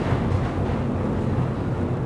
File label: mine cart LOOP; Cars; XBOX